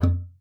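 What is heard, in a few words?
F3, bass flute; Xsample VOL-2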